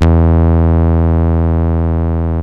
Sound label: saw, yamaha rm1x synth